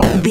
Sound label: retro impact hit general